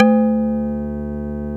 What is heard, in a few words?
LOG, VS LogPad